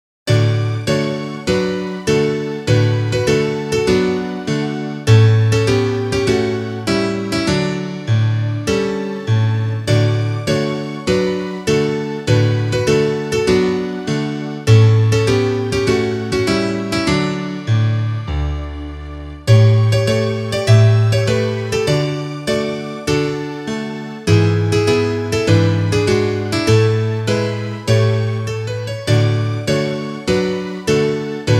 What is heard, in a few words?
instrumental